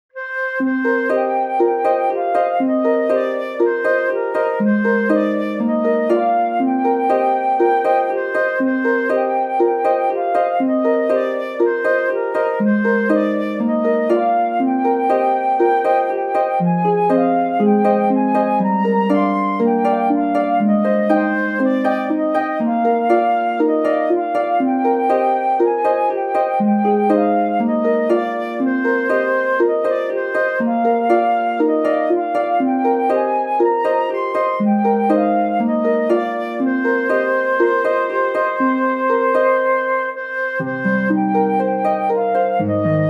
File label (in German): God rest ye merry gentlemen Harfe und Melodie